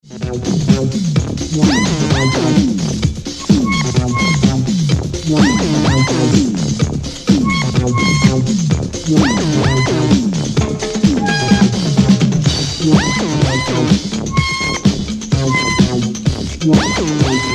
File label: crunch funky beat